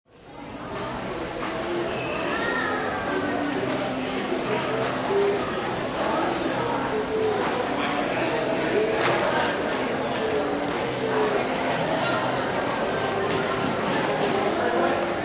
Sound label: Crowd Large Shopping Mall, Indoor american shopping mall; in hall area between shops; Busy crowd, many people walking, talkin